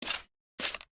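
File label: smg inspect swipe; viper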